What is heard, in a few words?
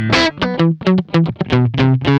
GUI, LICKS; 110 LICKS